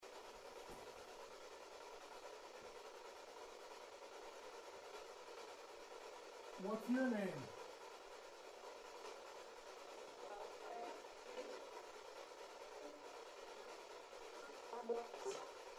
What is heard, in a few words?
ghost box